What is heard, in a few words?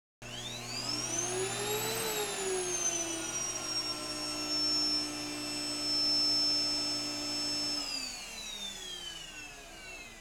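soujiki, vacuum cleaner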